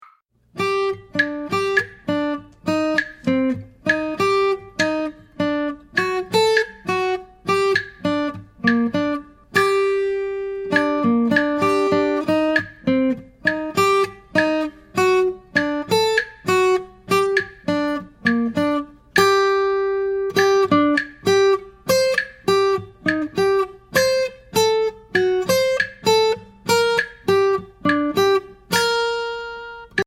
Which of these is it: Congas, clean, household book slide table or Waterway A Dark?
Congas